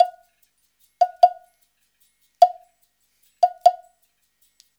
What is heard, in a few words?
Modern Percussion Loops; Soundscan vol.56; AKAI